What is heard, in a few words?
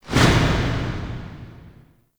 Interior Orbital Station March; Warframe; Tau